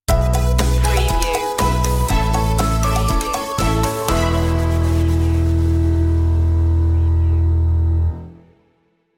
walking on air stinger

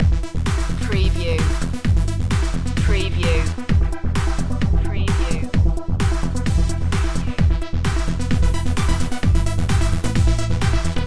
house loop

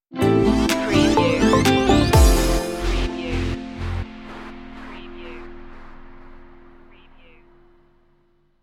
summertime beats stinger

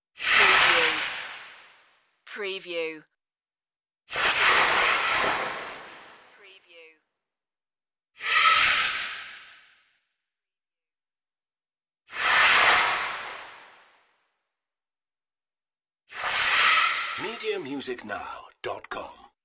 alien escape swooshes

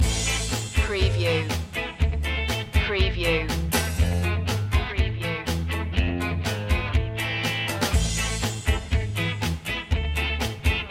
cold war ska loop

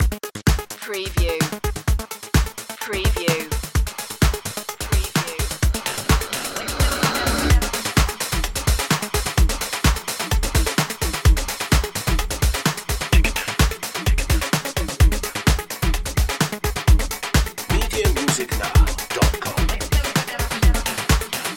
fumble loop

